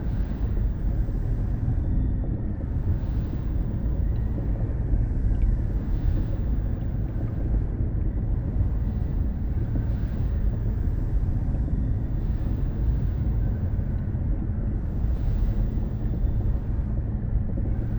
juggernaut idle; aquatics

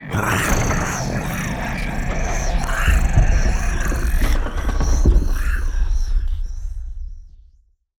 lithoid portrait snake; lithoids; Stellaris

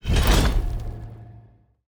exhibition unlock; Stellaris; grand archive

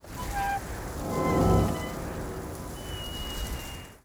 ghost town; Stellaris

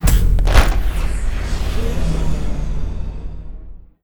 salute; Stellaris; nemesis

